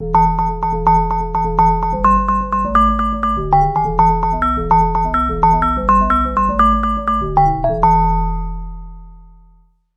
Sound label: music box bass